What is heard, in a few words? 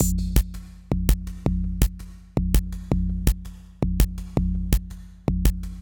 606 165 Intro, Drum Machines; Cathode; Ableton